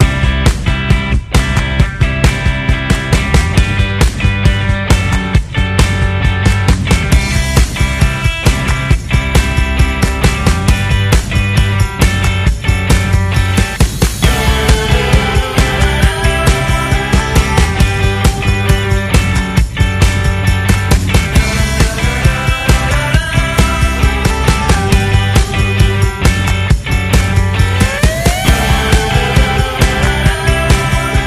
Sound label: indie pop inst